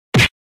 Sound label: kick enemy; KickSurvivors